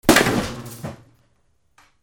CARTOON

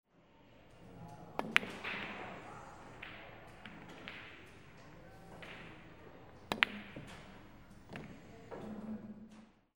BILLIARD

